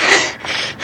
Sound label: Fatigue Hard; 1916 der unbekannte krieg gamerip